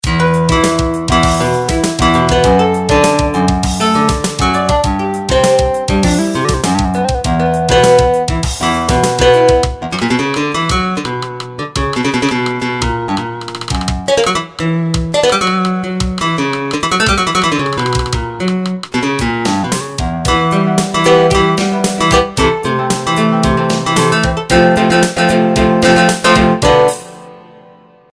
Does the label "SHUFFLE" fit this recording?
No